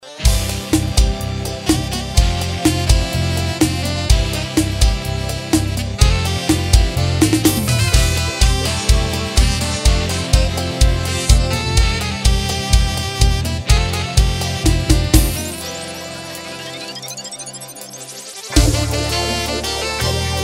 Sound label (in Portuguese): abertura ao vivo